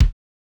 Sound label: kick, house